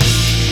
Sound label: guit hevvy (Bb); Sonic Foundry (Sony Creative Software); Ugly Remnants VOL-2